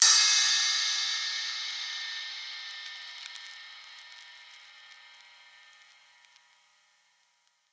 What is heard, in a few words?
ride; eddyflux